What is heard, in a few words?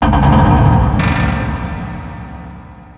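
metal scrape reverb; ambient atmosphere; Counter Strike Model Pack; CS:GO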